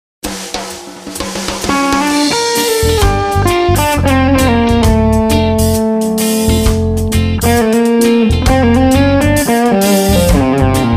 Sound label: Plush Drive; Fuchs